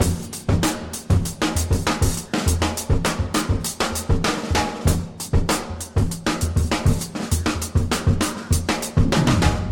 99 bpm drum loop sample A key, kick tuned to the A note